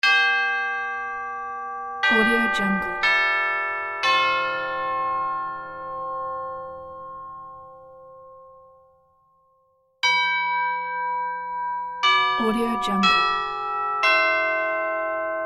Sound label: christmas chimes; mastablack-fk